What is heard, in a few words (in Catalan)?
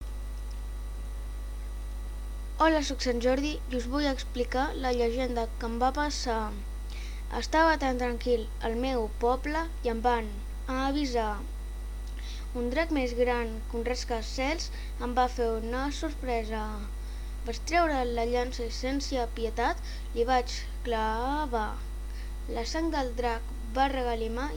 RAP DE SANT JORDI